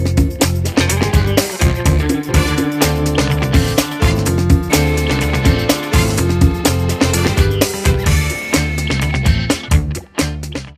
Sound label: Funk